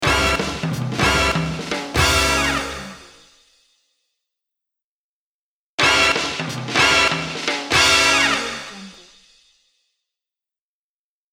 crazy big band logo

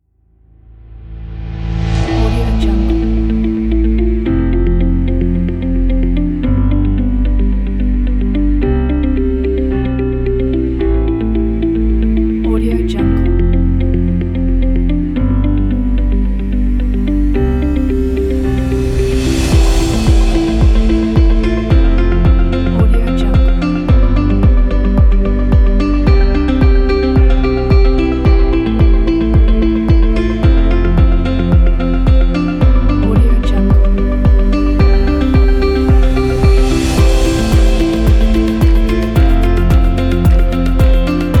summer ambient upbeat pop

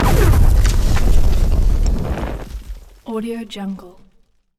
attack defense whooshy rocket explosion; Robotic Lifeforms 2

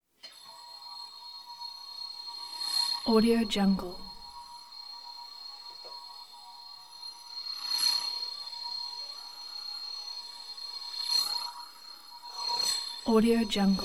scifi flying shimmering metallic hiss surging

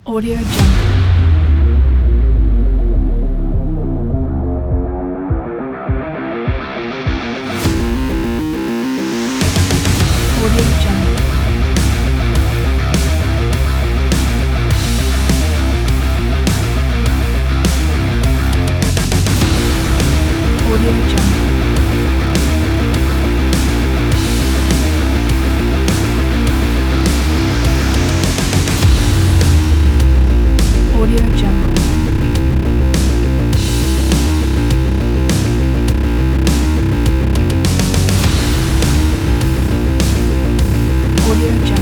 action energy sports music

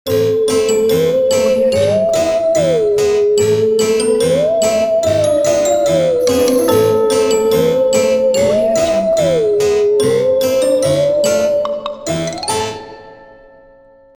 halloween logo

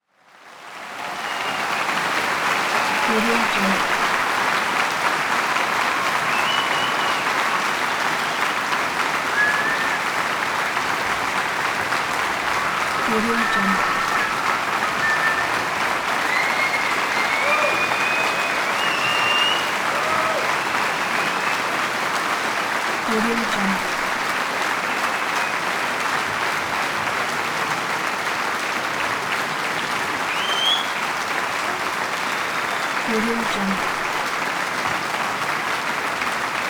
concert hall heavy applause loud whistle

